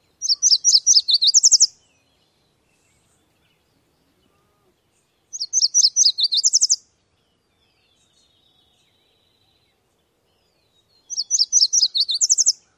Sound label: songs